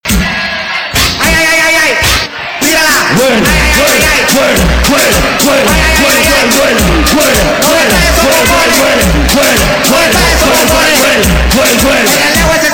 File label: tonic